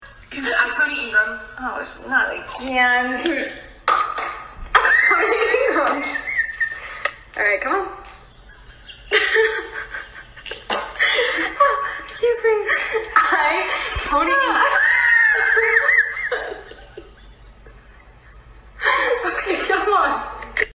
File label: Laughing Agreement